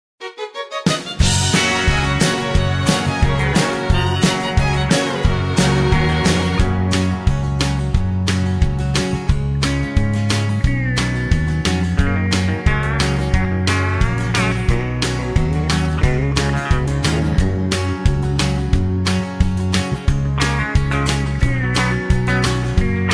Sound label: (Gb) No Backing Vocals